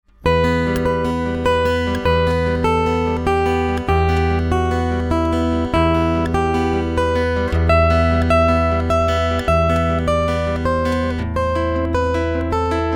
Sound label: Spanish Romance, guitar